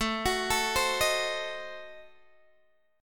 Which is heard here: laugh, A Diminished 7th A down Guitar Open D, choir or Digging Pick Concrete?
A Diminished 7th A down Guitar Open D